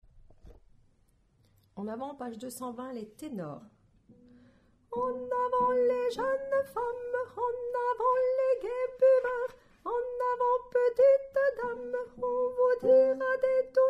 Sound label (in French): Tenor